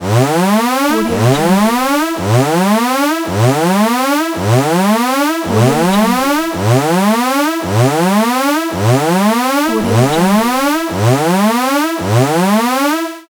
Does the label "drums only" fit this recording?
No